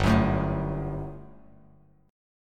G Major 7th Suspended 2nd, Bass Standard; GM7sus2 strummed; 3,9,7,2

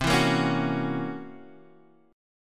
C 7th Flat 5th Flat 9th C, chord

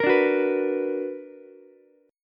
B Minor Major 7th, Ukulele; strummed; JGuitar

